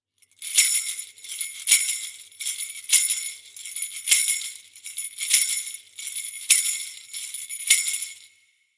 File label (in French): impro grelots; loto sonore